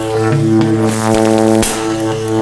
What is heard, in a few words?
Electricity